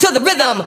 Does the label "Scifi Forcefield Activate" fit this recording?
No